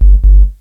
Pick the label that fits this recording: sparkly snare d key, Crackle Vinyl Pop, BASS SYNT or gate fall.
BASS SYNT